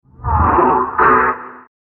Deploy Probe, spacecraft; Spacecraft Sound Effects; science fiction sound effect; star wars / trek style games

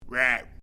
Created Creature Small Call, Alien Sound Effects

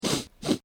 Man Sniffing Through Nose Sniff Sound Effect; Sniffling; human; Andrew Fleming

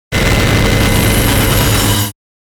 Industrial Hum Static Saw Grind; Rumble; Production Element Imaging Element Accent Transition; Blastwave

